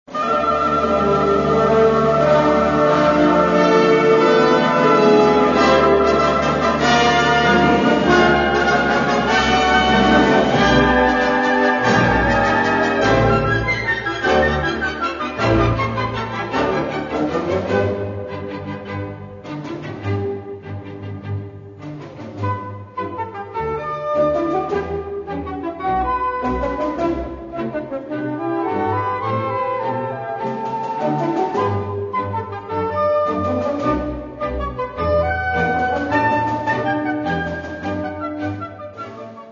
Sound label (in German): Festlicher Marsch